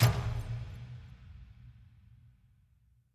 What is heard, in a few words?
bet Level Change; mercy of the gods